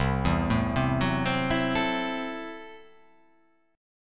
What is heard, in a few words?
C 6th Flat 5th, C, down